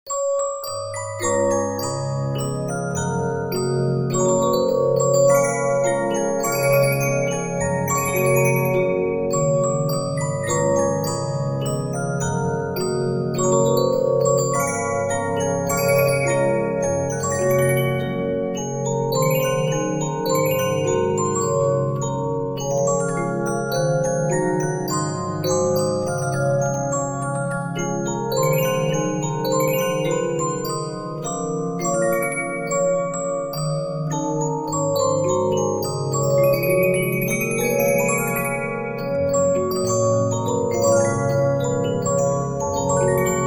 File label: Regina, 15inch disc musicbox